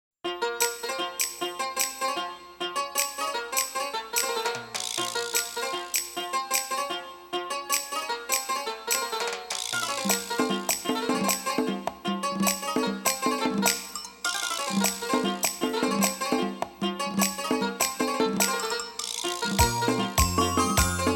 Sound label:  (Instrumental)